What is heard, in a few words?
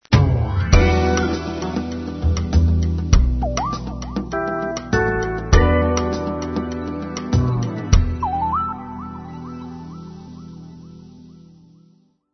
jazz; jazz 1 jingle; music logos